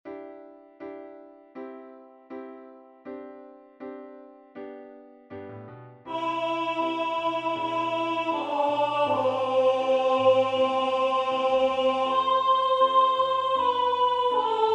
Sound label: Hymn Alto; remembrance day